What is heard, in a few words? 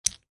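sounds of playing checkers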